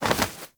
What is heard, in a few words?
Bird Of Prey Wing Flap Up; Characters; Warframe